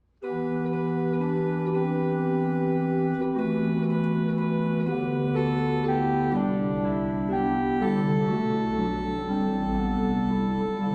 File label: Tone